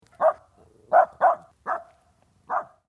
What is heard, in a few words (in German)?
Hund bellt